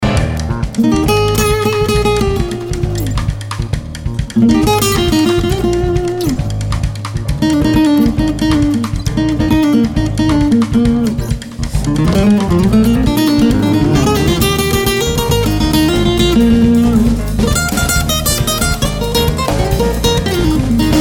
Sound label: Bossa